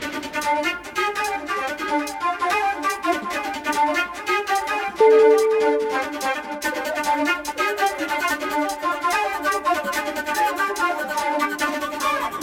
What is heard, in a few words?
groovy flute